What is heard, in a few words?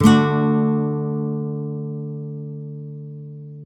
Chord